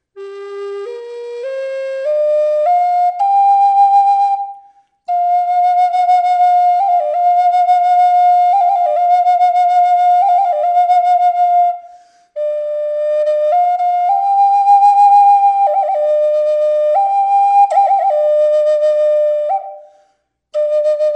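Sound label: reverb, flute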